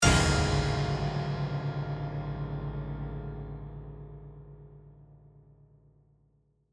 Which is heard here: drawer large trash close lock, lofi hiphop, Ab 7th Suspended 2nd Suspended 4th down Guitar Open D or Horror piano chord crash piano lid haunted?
Horror piano chord crash piano lid haunted